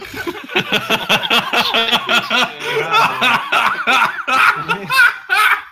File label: laugh